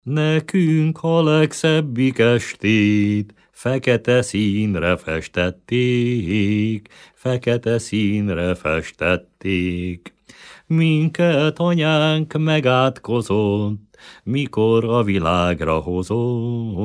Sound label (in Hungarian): nepdal